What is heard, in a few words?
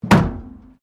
METAL BIN IMPACT PLASTIC CRASH BARRIER